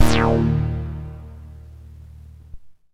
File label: MASS LEAD, Synth; InVision Interactive; Keith Emerson